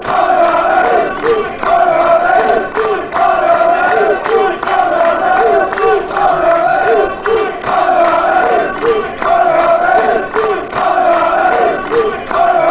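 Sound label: barmy army afc, football songs and soccer chants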